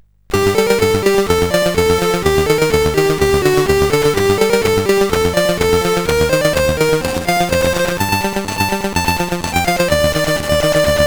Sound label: alternative drums, CSG8580R5; Arkanoid